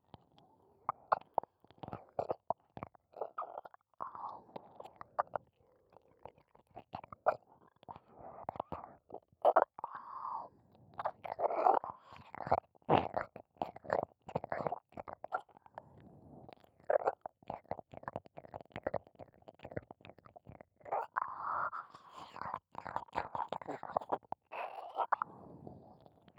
耳舐め・普通; 性欲が強すぎる淫乱メイドのご奉仕ハーレム《夏休み(エクストラサマー)!!》

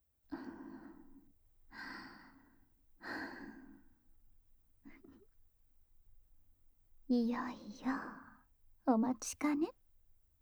濡れ耳かき×耳奥舐め→耳元囁きぬっちょり手コキ; 一人で両耳責めしてくるプロ級耳"奥"舐めマスターなお姉さんにお耳の奥の奥までほじほじグポグポされる話

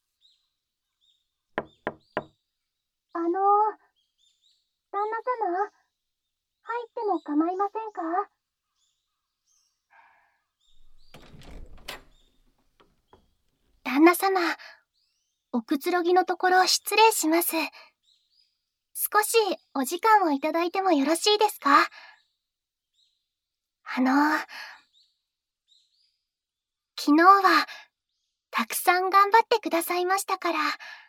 マッサージと耳舐め, ASMR; SEあり; 尽くしちゃう系幼妻エルフとのあまとろ発情新婚生活【KU100バイノーラル】

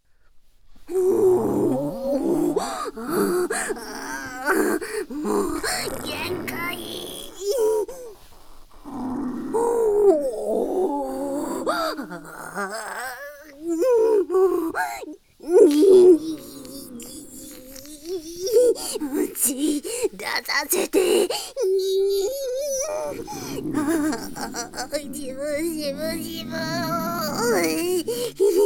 お湯灌腸排泄シーン 効果音入り; 実践!有機肥料の作り方 熟女指導員によるおほ声講習

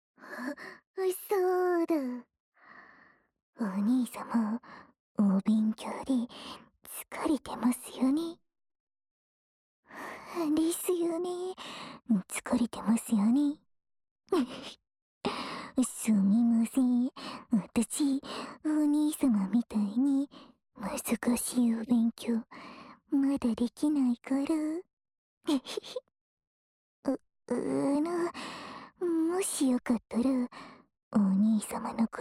膝枕と小さな指で耳かき; メスガキ大家さん; ヤンデレメスガキ大家さん 服従編

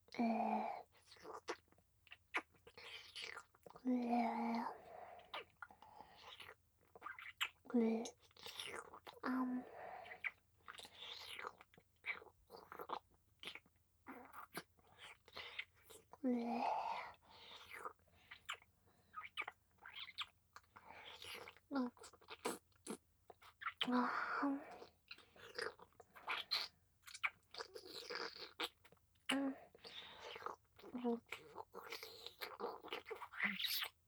フェラ 普通

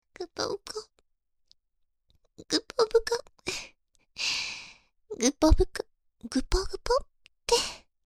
ぐぽぐぽ; NG集; とろあま双子ナースの包茎回診～あま～い双子ナースに、入院生活で欲求不満の包茎チ〇ポをとろっとろにしてもらいました～

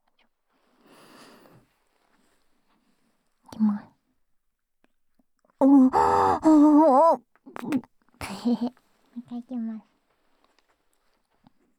"ぷへへ"

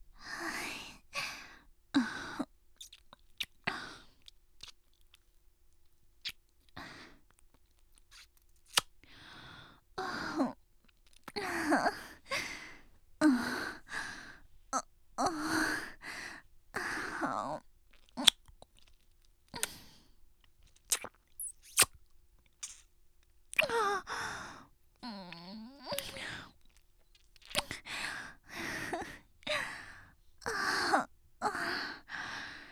キス; マゾハーレム; RAINA様

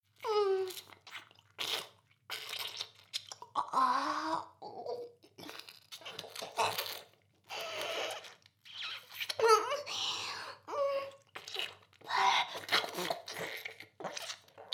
フェラ, ASMR; 全妹計画２; メスガキ妹; 全妹計画<オール・シスターズ> 2妹め

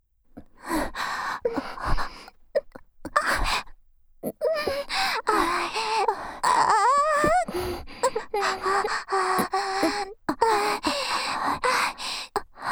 ダウナー姉妹の本気耳元密着喘ぎループトラック, ASMR; 銀髪転校生姉妹

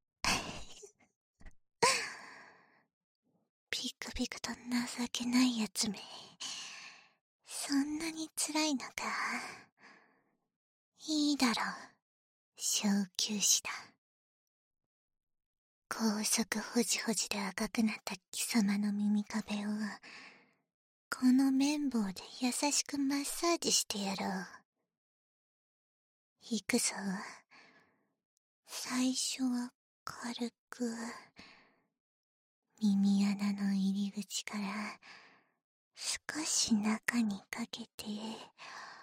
【右】綿棒, 耳かき

